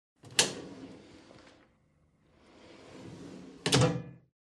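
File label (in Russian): в медицинском кабинете выдвижной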